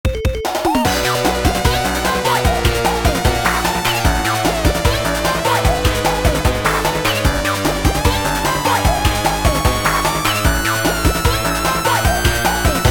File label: Genesis Instrumentation (No Vocals); Every Generic FNF Sonic.exe Song With Genesis Instrumentation